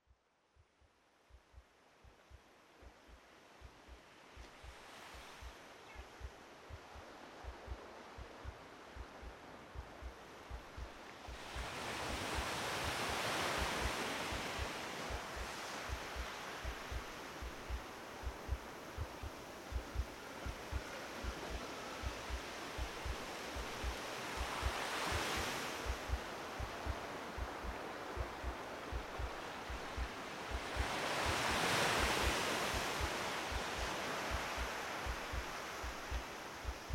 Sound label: Beach Waves